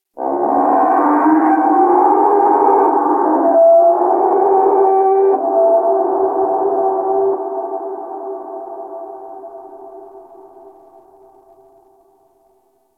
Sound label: Ice Creature Distant Cry; Warframe; Corpus Ice Planet